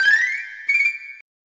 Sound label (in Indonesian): Nada Dering Nokia Jadul Message